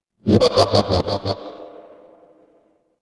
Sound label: fire electric arc